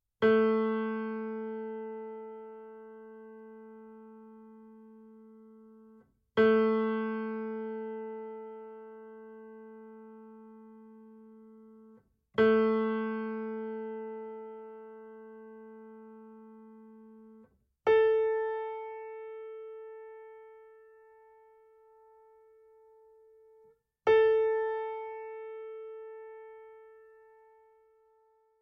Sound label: tuning note a